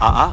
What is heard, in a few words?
"ah ah"; Voice; ooh ahh